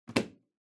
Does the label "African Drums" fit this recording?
No